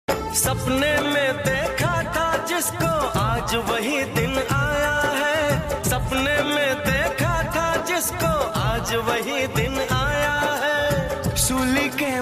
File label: Desh Bhakti Song Ringtone